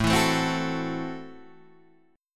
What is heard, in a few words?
Gb Minor 7th Flat 5th, Guitar